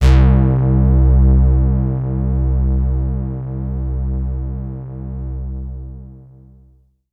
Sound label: BASS